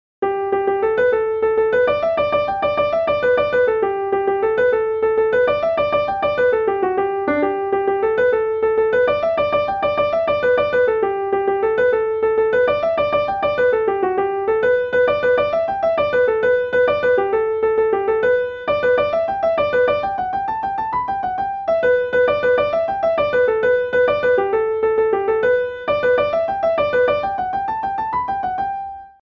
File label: Jig, Midi; Kesh